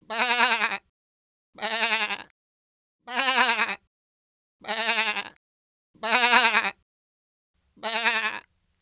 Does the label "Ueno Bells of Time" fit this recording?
No